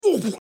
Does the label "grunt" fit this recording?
Yes